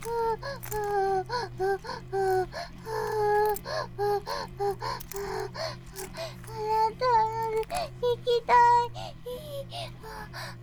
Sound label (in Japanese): バイブ放置; イクたびにわけて